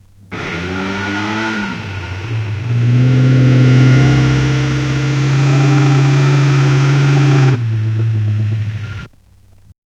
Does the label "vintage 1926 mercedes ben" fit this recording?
Yes